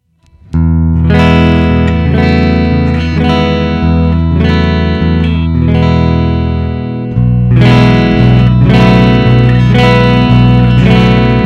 clean chords all positions; Schecter BANSHEE MACH 7

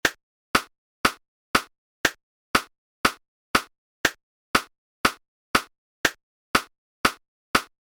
click; workshop stems

